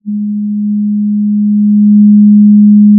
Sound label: pop, Sound of a cruise ship horn